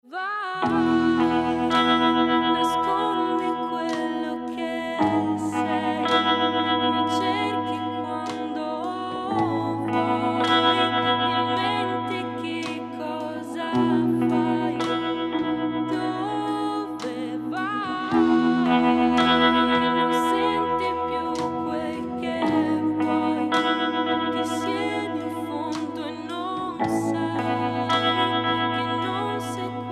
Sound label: RHODES; GT RHODES; RecLab